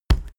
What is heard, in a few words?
step wood step